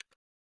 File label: empty, weapons; ACE! 52